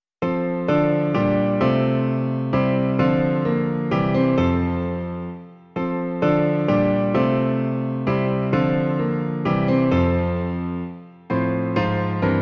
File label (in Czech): - klavír; Klavírní verze